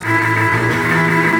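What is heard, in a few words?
Seq String Groove, Drum & Bass; Sonic Foundry (Sony Creative Software); Knutrix Drum & Bass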